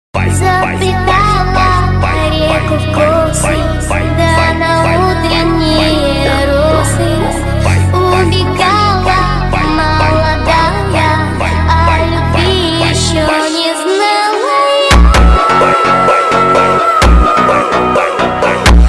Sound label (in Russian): бразильский фонк